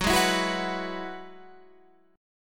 Gb 7th Suspended 2nd Suspended 4th, Guitar, Standard; Gb7sus2sus4 strummed